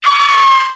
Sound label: attack